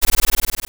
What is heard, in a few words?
fly